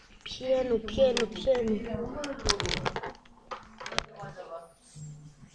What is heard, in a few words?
piano